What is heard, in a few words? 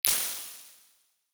simulated impulse responses; amt